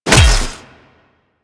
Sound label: Melee Hammer Impact Robotic Flesh; Warframe; Weapons; Hammers